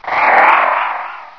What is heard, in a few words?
race idle